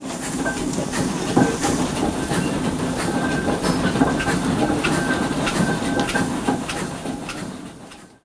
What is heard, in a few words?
traction engine; simutrans; Pak128.Britain-Ex